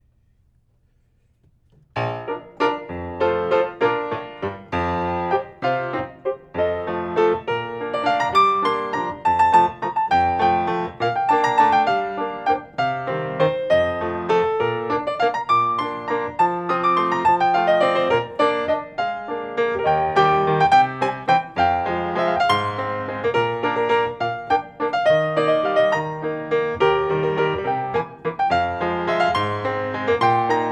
Bb waltz bright; Bayadere

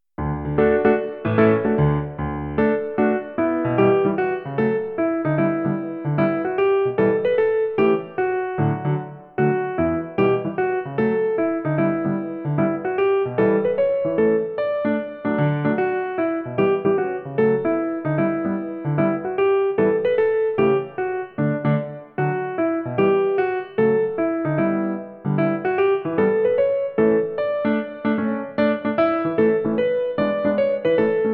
Reggae 150

